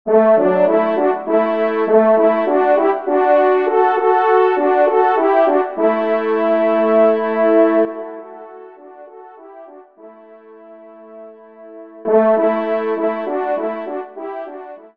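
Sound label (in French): duo, ensemble, trompe cors; Kling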